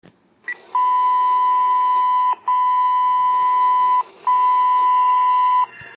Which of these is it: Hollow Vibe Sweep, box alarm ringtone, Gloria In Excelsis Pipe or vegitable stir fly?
box alarm ringtone